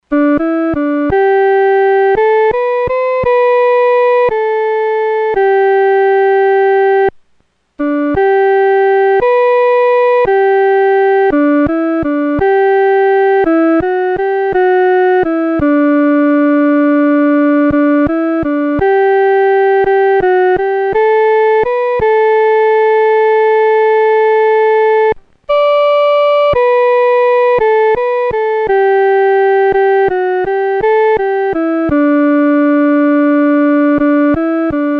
独奏 第一声

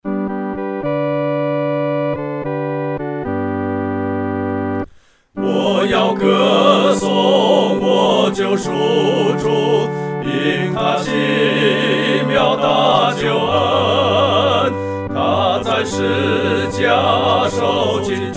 合唱 四声部

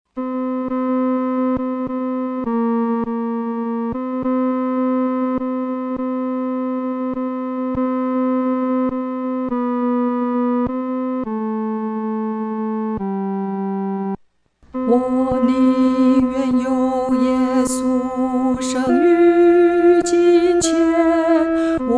独唱 第二声

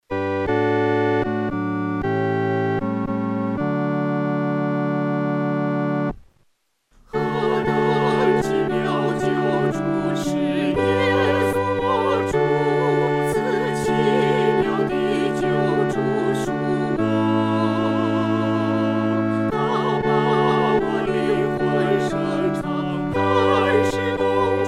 合唱（四声部）

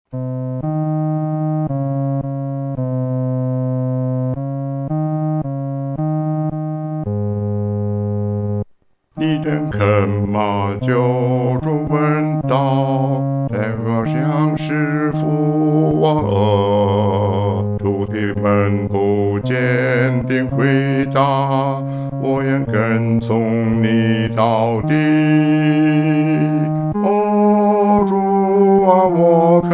独唱 第四声

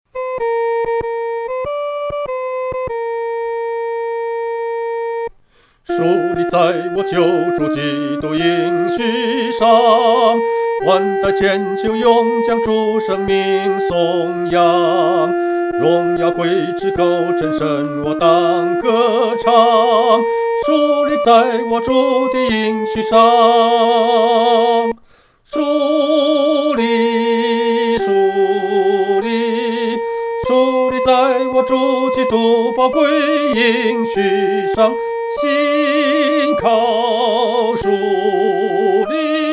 独唱 第一声

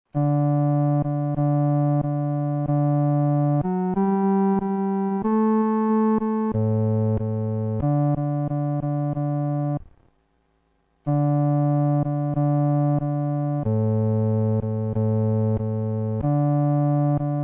独奏 第四声; I Surrender All; Judson W. Van de Venter, 1855-1939

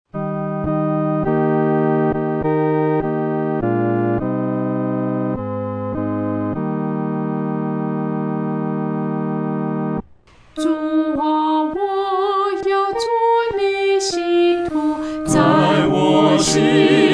合唱 四声部

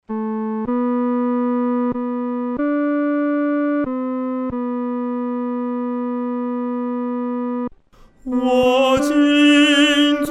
独唱 第三声

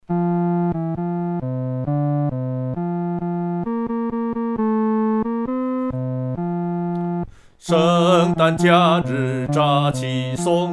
独唱 第四声

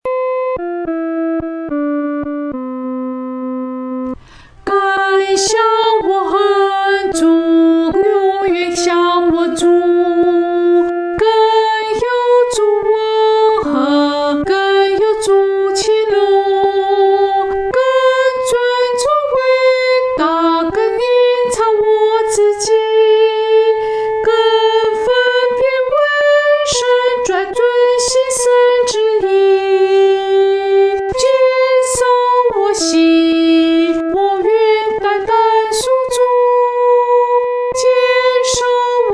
独唱（第一声）

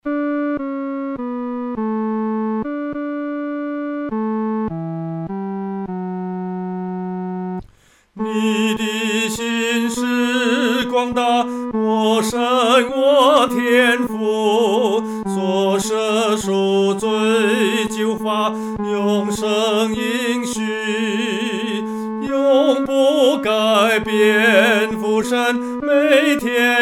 独唱 第三声

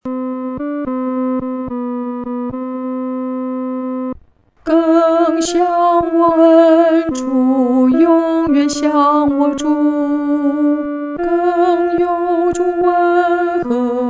独唱 第二声

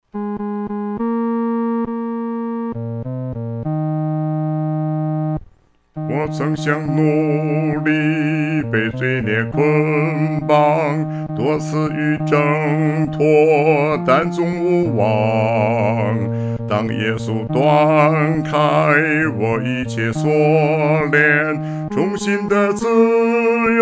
独唱 第四声

